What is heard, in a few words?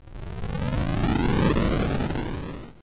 TAKEOFF MENU